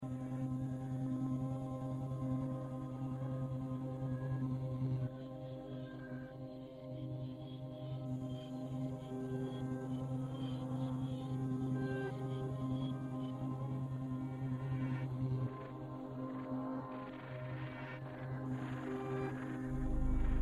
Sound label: back in time musik; life is strange; chloes dad